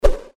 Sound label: Fighting Swing Sound; FXHome